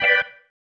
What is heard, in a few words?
Stab One Shots, Organ